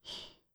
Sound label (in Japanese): ブレスミディアム